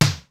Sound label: kicks snare, kid; Kid Nepro; Elite; KICKS SNARES